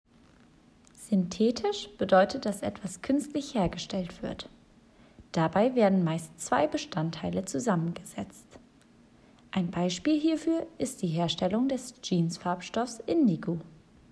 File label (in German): Synthetisch